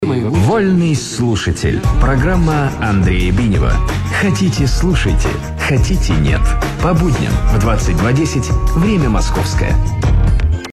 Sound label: blues